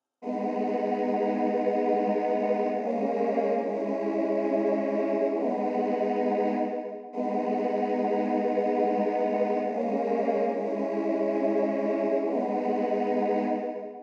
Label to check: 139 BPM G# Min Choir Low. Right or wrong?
Right